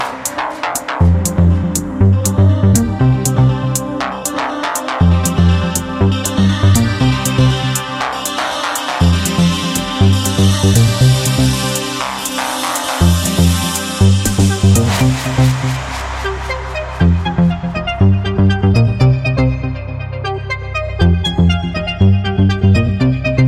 Disco

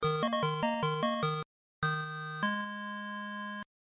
Lose A Life

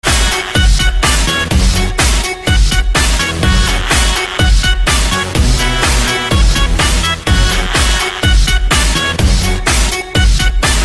Punjabi

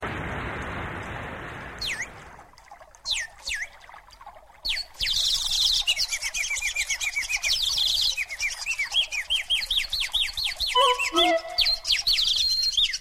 Bird Ringtone

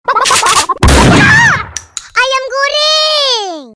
Ayam Guring

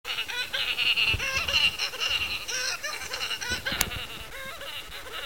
Bubblebass

